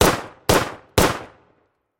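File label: pistol sounds; zvuki pistoleta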